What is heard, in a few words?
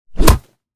snap kick; battle